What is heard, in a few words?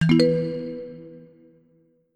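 puzzle ship solved; through abandoned 3 the refuge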